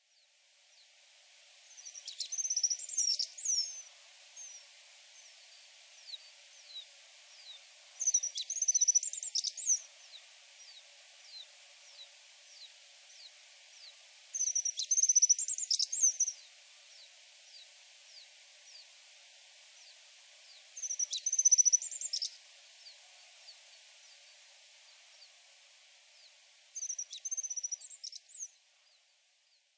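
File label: Alpine Accentor; iwahibari; norikura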